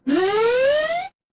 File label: retro game jump